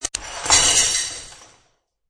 Dumping broken glass into paper trash bag.; Kitchen; Household

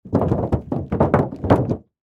Impact Automobile Hood, Buckle; Crash Sound Effects; Crashes; Objects

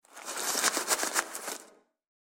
Warehouse Searching Through Garbage; Garbage Sound Effects; Foley Sound Effect

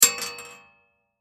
Bullet Shell; Bullet Shell 44 Drop Metal Catwalk; Drops (Sound Effects:Objects); Blastwave; Warfare

